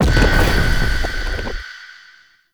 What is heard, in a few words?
Dodge Trap Burst; Characters; Enemy; Abilities; Circade